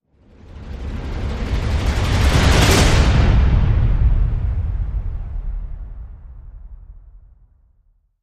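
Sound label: Crescendo Massive Roll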